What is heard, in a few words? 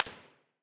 mid, whizz; tacz-tweak-pack; taczmechanics